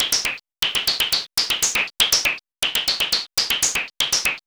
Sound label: MOOG HAT; AMG - Vince Clarke Lucky Bastard